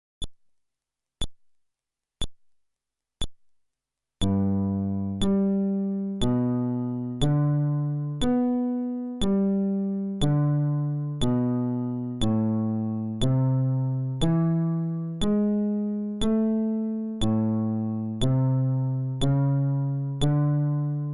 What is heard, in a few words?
Arpeggio, fretless bass